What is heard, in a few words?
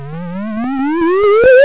GAME END, EFFECTS